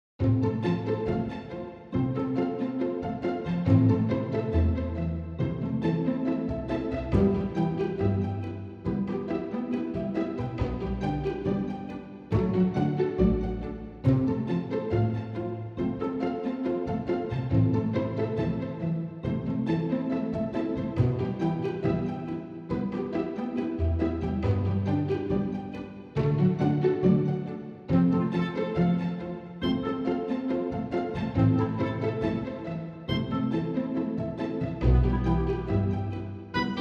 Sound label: pizz changes short